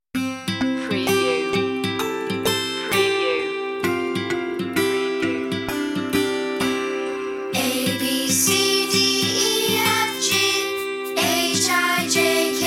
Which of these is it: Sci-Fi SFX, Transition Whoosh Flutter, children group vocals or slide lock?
children group vocals